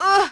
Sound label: hit; NWNDS; Imoen